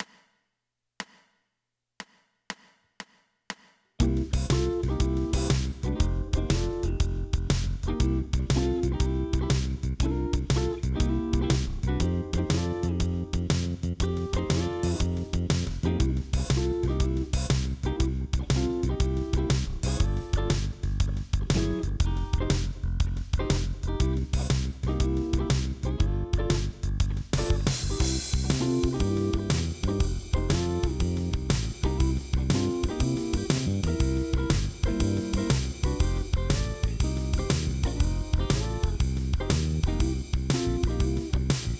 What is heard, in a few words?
Blues Solo Backing Track